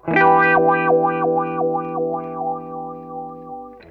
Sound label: WOWOWA, Funk Guitar; Zero G - Funk Guitar